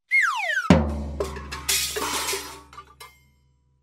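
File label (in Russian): свист и падение с дребезжанием всякого хлама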